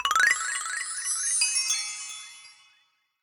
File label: wild Symbol; robin hood